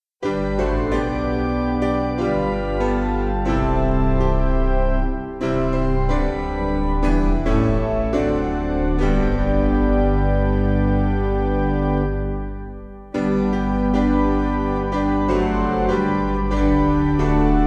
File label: O Piano